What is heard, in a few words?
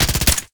vehicle